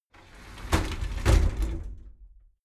shower slide door inside close regular

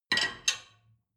dishwasher plate grab

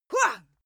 female attack

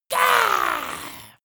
monster assault high; schreie

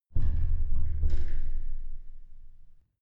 closing church door soft

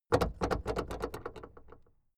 car camaro open trunklid long

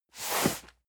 cardboard box medium slide hard; objekte

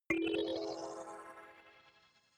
notification kalimba magical second

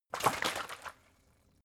bottle water big shake single; berufe

